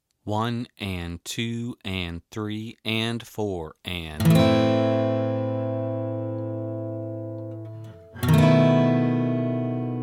B7 B11 Chords

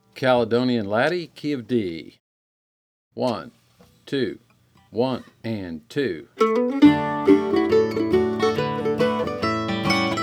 Caledonian Laddie

